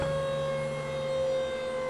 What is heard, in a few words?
57mm Vertical Move, Vehicles; WarRock